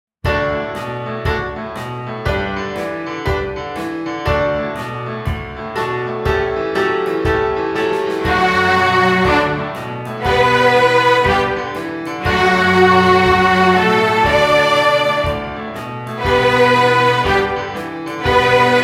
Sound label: Pizza Party Blues Full Ensemble